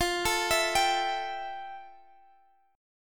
F Major 9th, Guitar, Drop D; x,x,15,14,17,15; down; chord shape

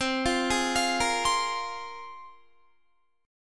C 7th Suspended 4th Sharp 5th, Guitar; C7sus4#5 arpeggiated; JGuitar

